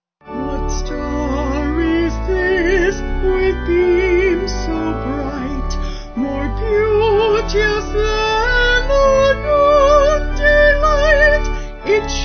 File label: Vocal Organ